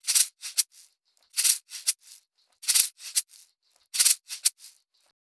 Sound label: PERCS L, Roots Funk; USB Soundscan vol.41; AKAI